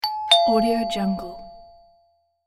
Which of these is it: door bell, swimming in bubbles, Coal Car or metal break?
door bell